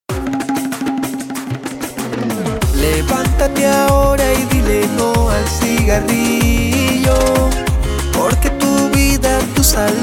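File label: jingle, long version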